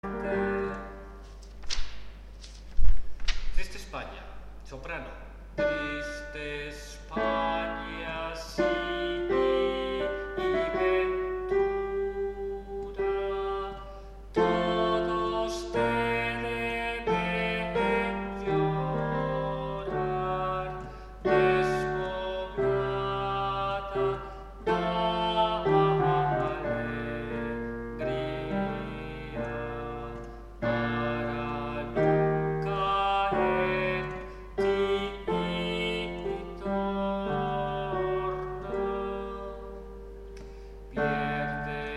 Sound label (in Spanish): triste espanha soprano